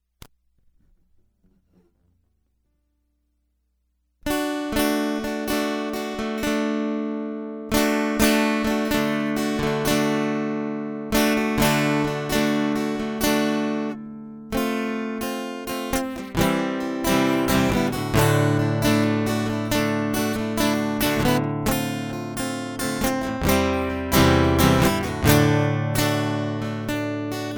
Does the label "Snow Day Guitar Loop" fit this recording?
Yes